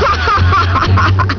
laff

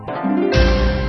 correct; correct answer; sound effects have been lifted from ABC's "Who Wants to be a Millionaire?" online game

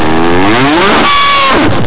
cow

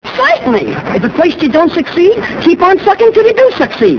success

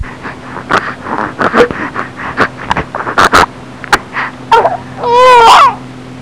snort; I often do this right at the beginning of a feeding.

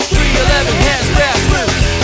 grass

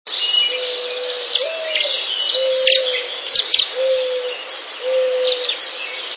mourning dove; Indian Head Point, Mohican Outdoor Center, Song

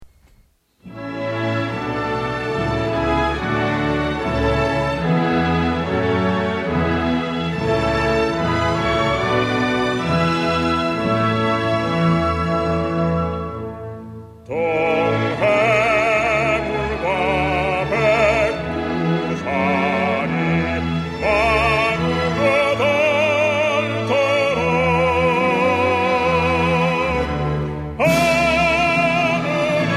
South Korean National Anthem; Himne Nacional de Korea

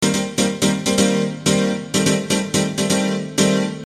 Piano Loop

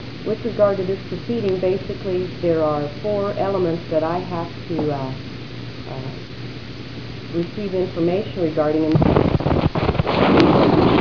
bomb

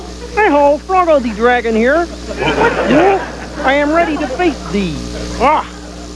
frogo

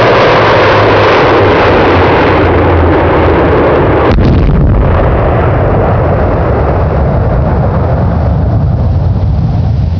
napalm, gunshots

